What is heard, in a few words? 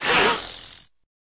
naga damage, monster